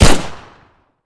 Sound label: pistol fire; player pistol fire2